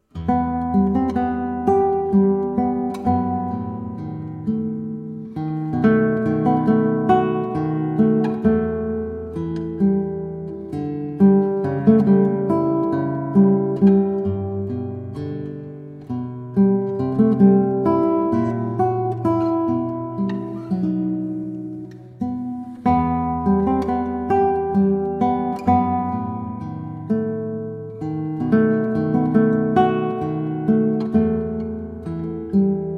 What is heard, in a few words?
sicilienne